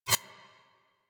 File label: storm mark; Warframe; Warframes; Ninja